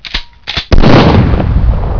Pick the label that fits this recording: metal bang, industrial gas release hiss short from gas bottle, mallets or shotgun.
shotgun